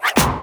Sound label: miss